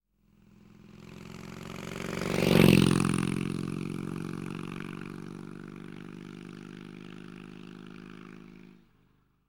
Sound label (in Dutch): Motorfiets Passeert; KMP Film Video Sound Effects